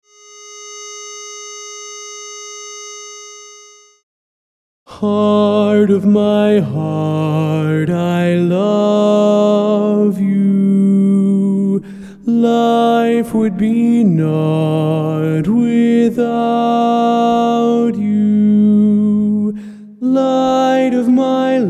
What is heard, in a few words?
Lead